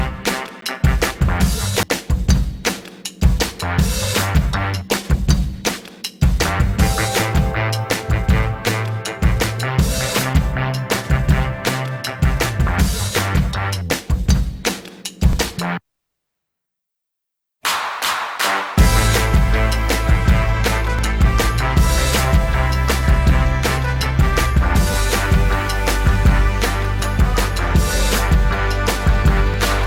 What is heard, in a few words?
instrumental